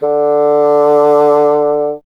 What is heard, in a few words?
WND BSN D, Bassoon; Miroslav Vitous - Solo Instruments VOL-1